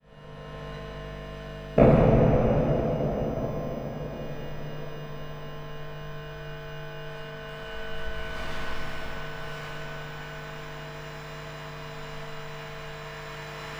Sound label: Electromagnetic Fields, Buzz; electric fields